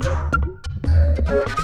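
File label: Music Dpad 145 Maxisphere; from dust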